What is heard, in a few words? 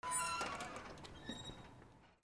SQUEAKS